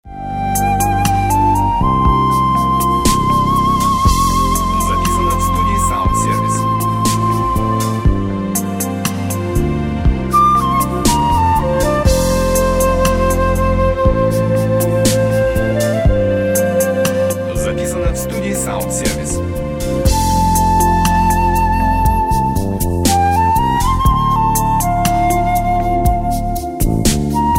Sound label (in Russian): Muzikalnoye soprovozhdenie; Tainoe Stanet Yavnym